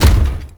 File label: Step; Warframe; Corpus; Jackal Redux; Steps